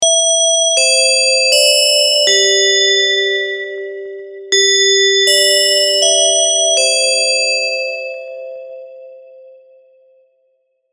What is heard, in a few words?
kinkonkankon